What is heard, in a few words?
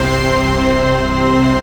dance chord